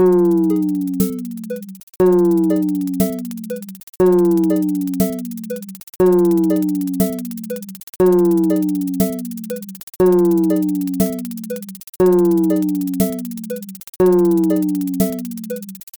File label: beat modern exyu